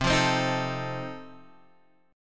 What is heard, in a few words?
F 7th C